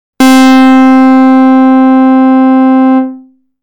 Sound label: Basic Pulse, synthlead